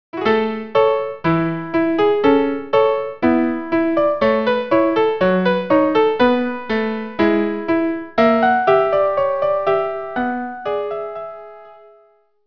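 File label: Norwegian dance violin viola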